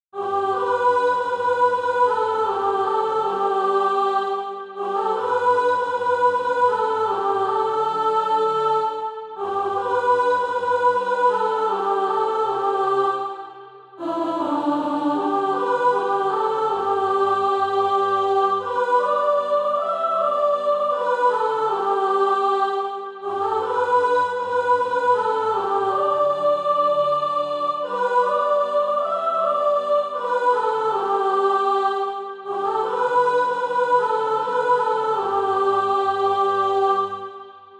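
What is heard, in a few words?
soprano, Practise Music; SATB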